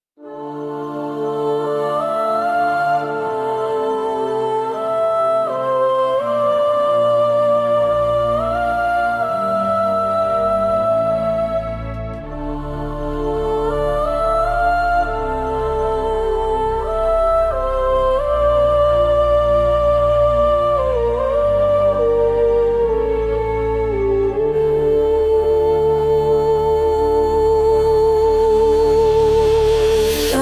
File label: Astral Waves